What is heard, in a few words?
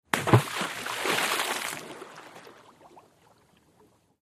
Splash Boulder Rocks